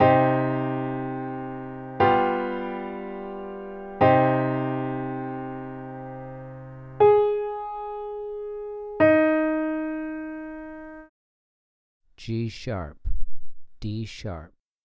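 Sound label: 2 Note Melodic Minor g#3 d#3, piano; melodic ear training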